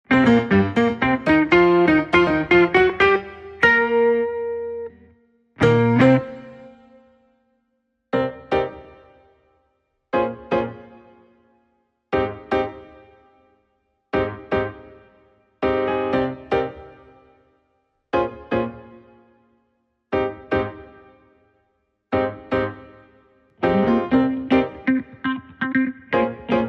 Keys & Guitar Only

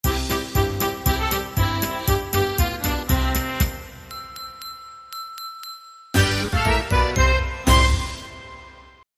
Christmas Polka

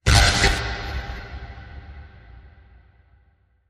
Apocalypse Warning Ping

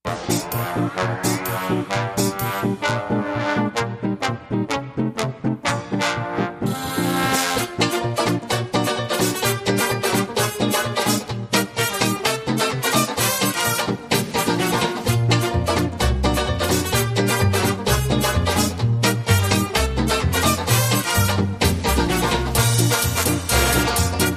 Charleston, Dance Remix No Drums

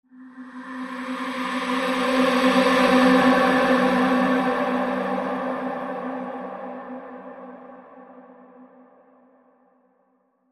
Angelic Sweep

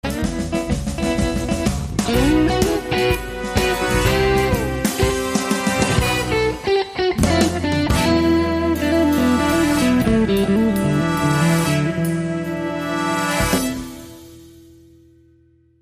Shuffle

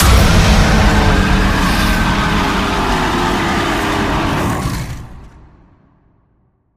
Bigfoot Behemoth Hit

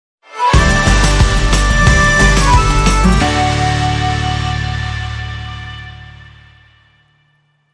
Sting

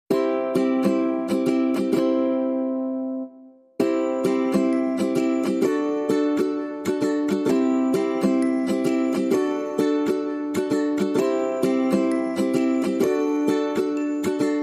Ukulele and Mallet Only

